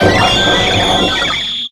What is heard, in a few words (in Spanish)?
Grito de Arceus